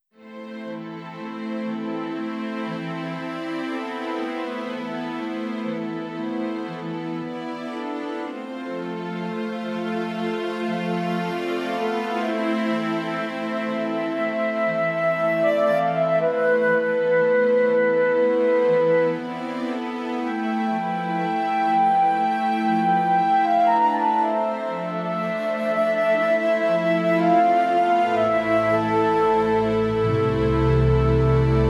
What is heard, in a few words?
Opening credits